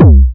beispiel kick; retrologue 2

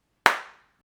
clap offen

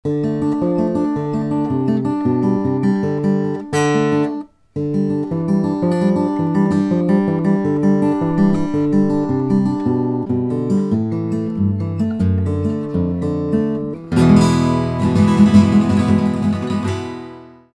linke Schulter, Westerngitarrenaufnahme

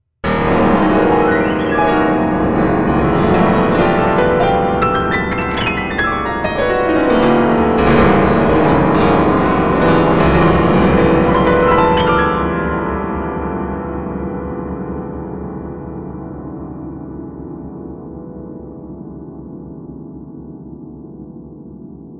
pianoteq wimmern; Piano-Plugin

